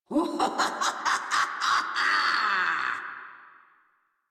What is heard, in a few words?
Laugh; Warframe; Grineer Catbrow Handler